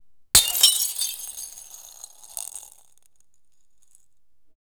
a ceramic vase breaking; breakage

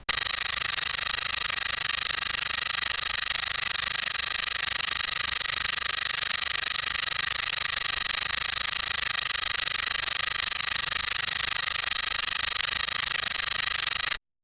camera shutter rapid burs

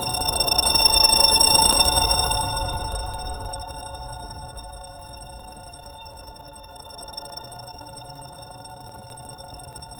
ambient magical shimmer, laboratory

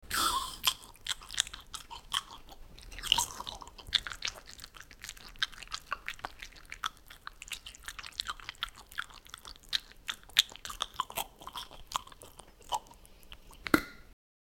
greedy eating; human behavior

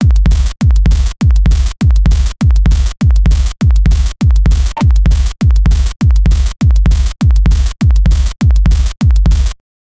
200 bpm jumpstyle loop

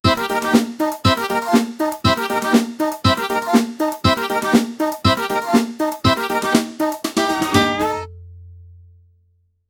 comedic marching loop all

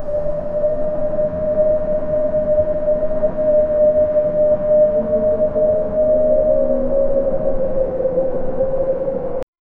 eerie ancient ruins with

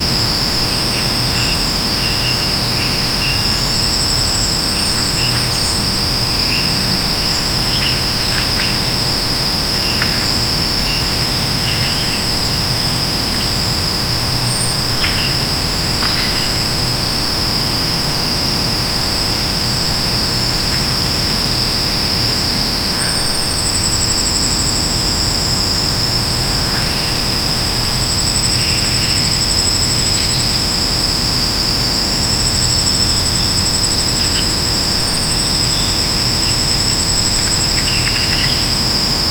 ambience of autumn forest

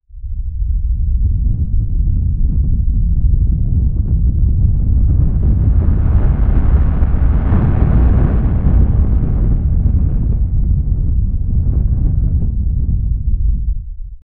two powerful tornadoes

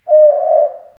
haunting owl screech low

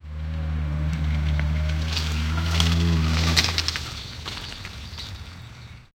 gentle oxen grunts plow, agriculture

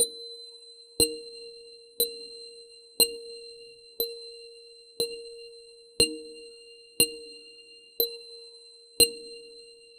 clean iconic rington

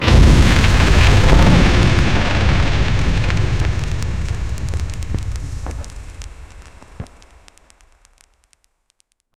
powerful magic casting so, explosive burst

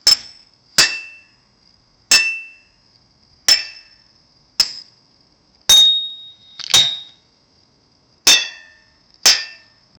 a single hit of an, farming

